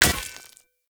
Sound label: Short Sword Throw Medium Impact Rock; Player